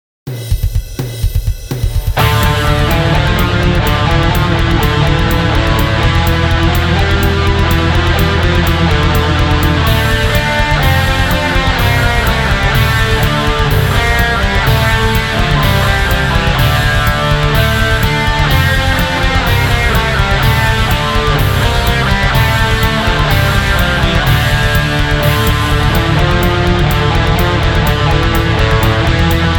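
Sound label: Rock version instrumental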